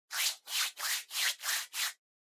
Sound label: Hand Foley, Rub